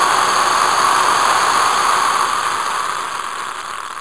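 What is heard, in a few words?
rain stick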